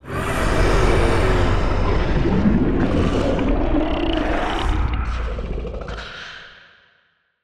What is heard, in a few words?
Distant Absctract Scream, Monster Sounds; TheDeclineOfWarriors; Monster Sounds Volume II